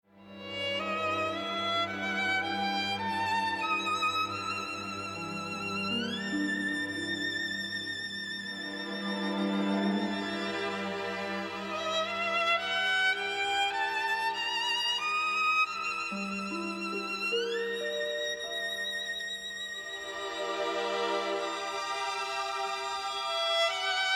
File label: violin concerto